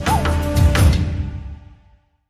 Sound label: bonus win; The Golden City